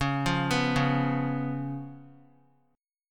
Db 7th Db down; Db7 arpeggiated; JGuitar

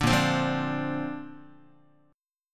A sharp Major Add 9th, Guitar; strummed

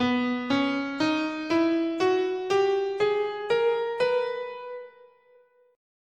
B Bebop Major, scale

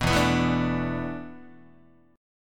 E 7th Suspended 4th, Guitar; E7sus4; strummed

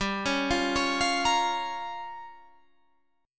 Bb Minor 6th, guitar

